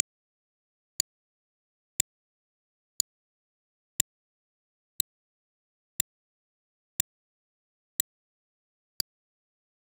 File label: ui button tick